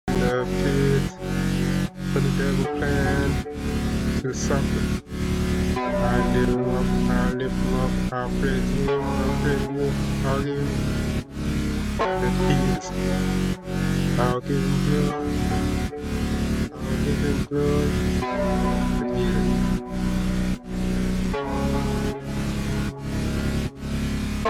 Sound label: Round Bell